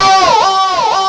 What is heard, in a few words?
GECH; East West - Global Sounds